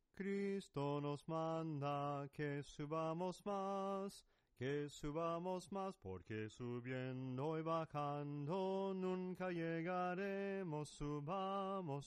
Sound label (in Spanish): SOPRANO CANTADO; CRISTO NOS MANDA